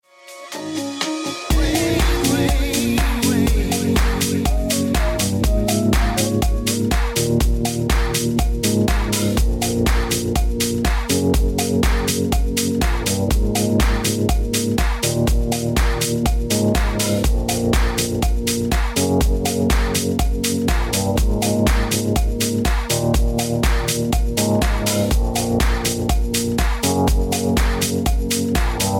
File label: German Summer